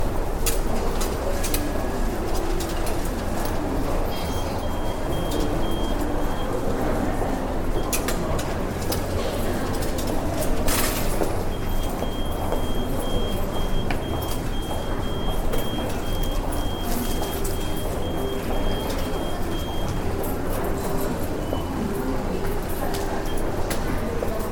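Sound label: subway ticket vending machine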